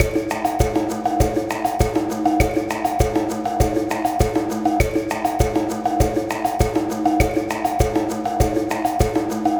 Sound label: Cave Ceremony, percussion